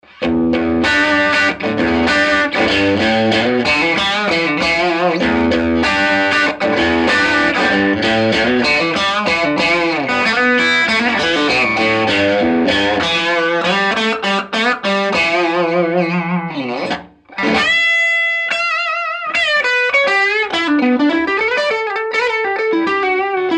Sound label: crunch bridge, Strat; schwarzbach; VP-Strat-56